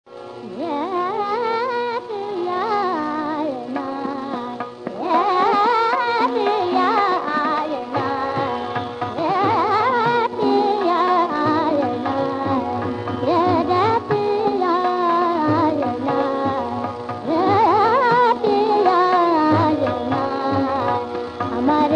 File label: thumri; mand